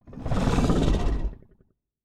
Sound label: Growl, Monsters; Fantasy Sounds Bundle